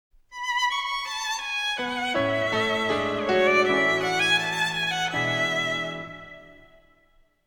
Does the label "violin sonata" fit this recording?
Yes